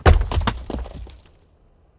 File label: rock fall